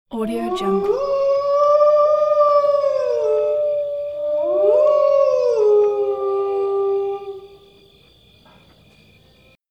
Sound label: wolf howling